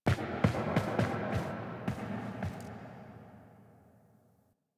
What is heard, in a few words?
Nursery Frame Foley Stairs; The War Within